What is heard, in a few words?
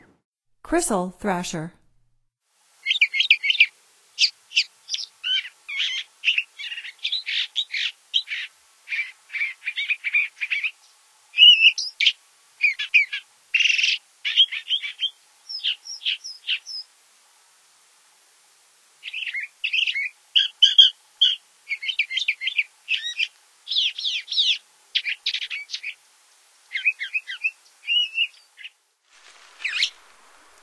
Crissal Thrasher, Songs of Birds of North America; Stokes